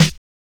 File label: Snare Loop, Drums, Dance Beats